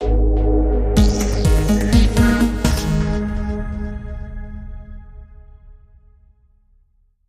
Sonic Techtronic

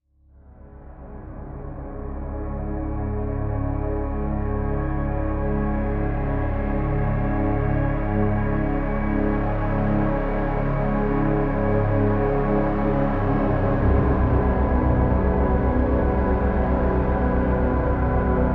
Warning

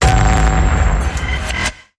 Hit; Hor Smithereens

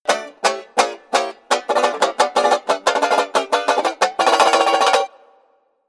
Wacky Banjo

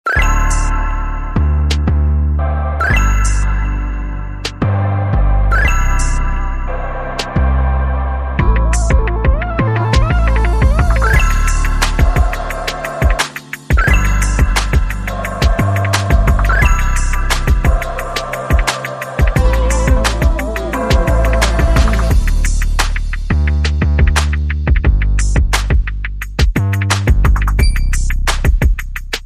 No Guitars

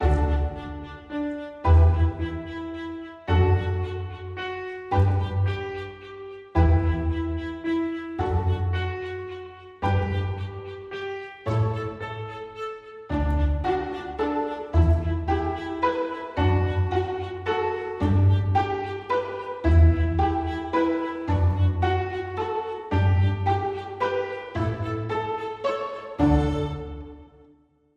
Underscore

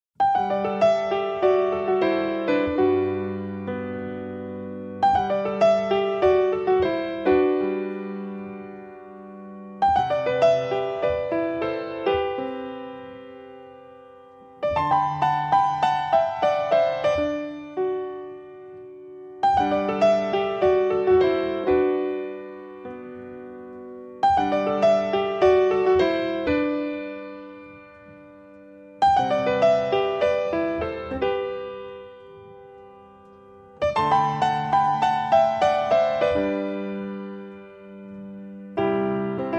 Green Forest, Piano and Gt Solo

